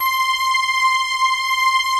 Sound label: GLASS PAN, pads; Pro-Rec - Synthsonic